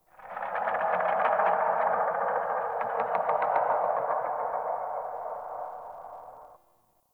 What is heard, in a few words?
Trees Talk; Ambience; Corrupted Ode